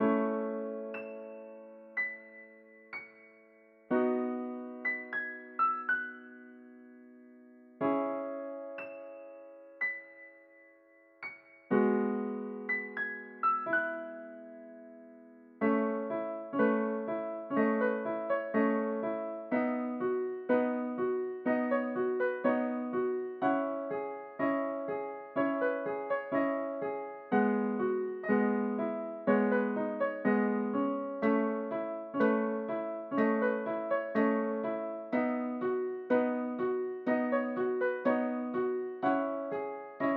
bitter adolescent piano; inbento

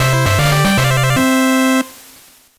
fanfarra

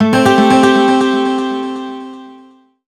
String Instrument; Chained Echoes

